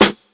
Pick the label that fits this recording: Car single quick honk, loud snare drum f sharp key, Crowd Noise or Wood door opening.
loud snare drum f sharp key